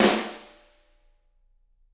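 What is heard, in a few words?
snare drum; Bob Clearmountain Drums I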